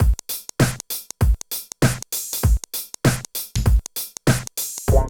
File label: DRUM LP; East West - Phat & Phunky CD2; 90's Sample CDs